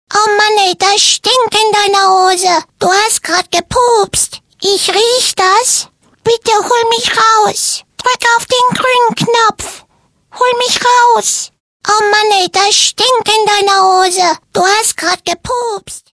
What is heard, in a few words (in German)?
pups, ringtones